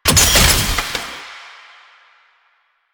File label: Gunbrella Reload, Weapons; Warframe; Tenno; Koumei